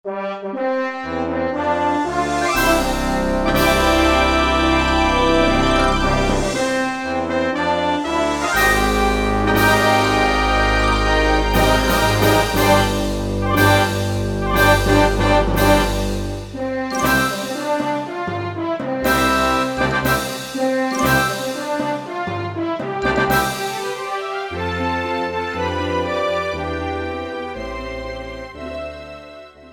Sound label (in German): Orchester; HyperCanvas